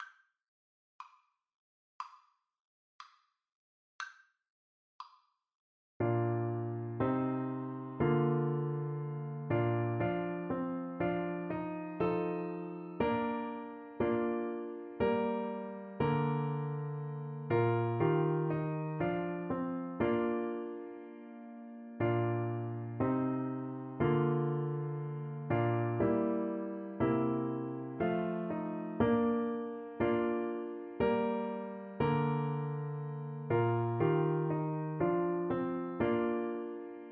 once in royal, tuba

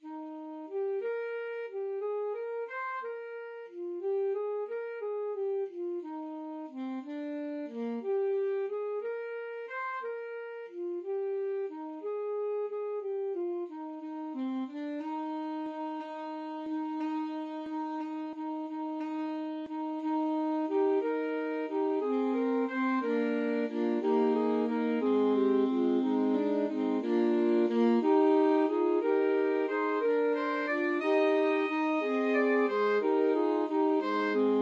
alto sax trio

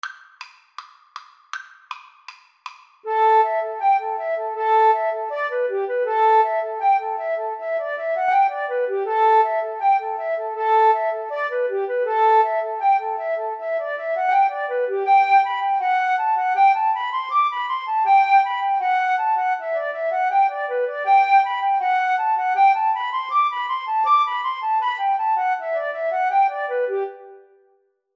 Flute Cello Duet; drowsy maggie

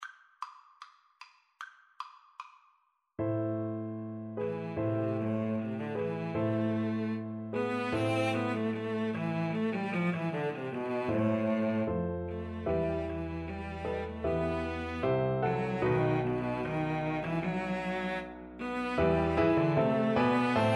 Cello Duet

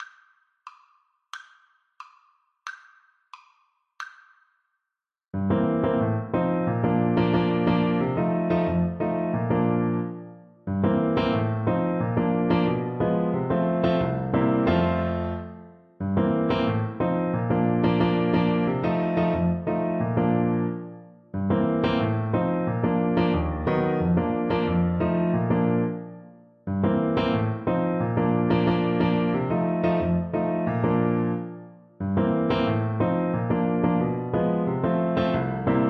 Trumpet

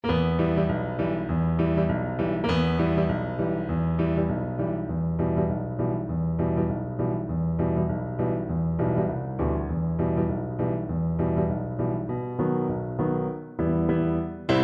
wellerman, Double Bass

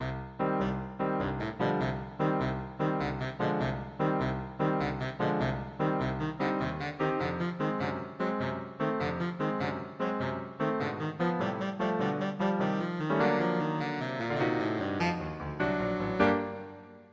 baritone saxophone

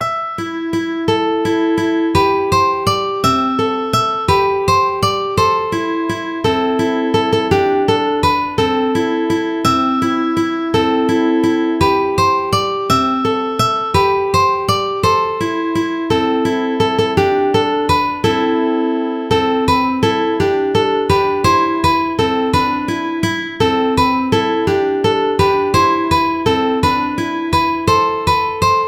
ukulele

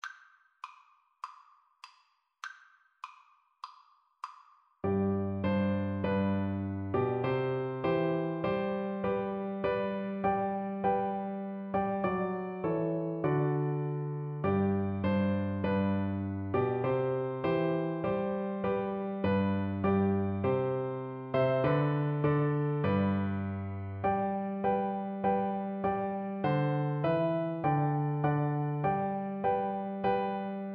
Cello

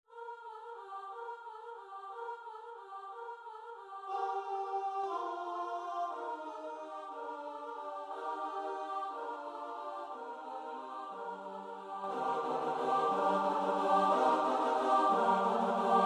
choir

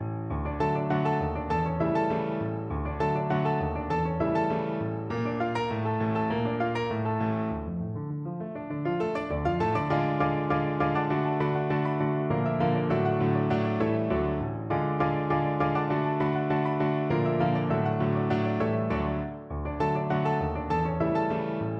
rag piano duet; maple leaf

